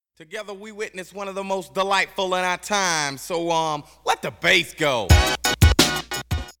Vocals ('let the bass go!')

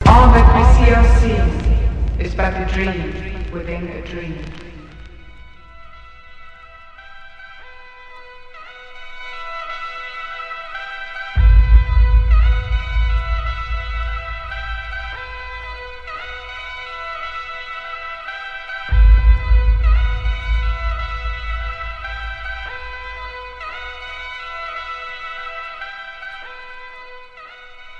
Saxophone & melody

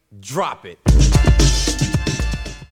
Vocals ('drop it!')

